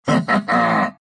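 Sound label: laugh, Russian; Sven; dota2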